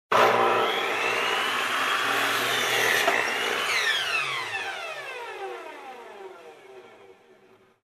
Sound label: Construction Drill Sound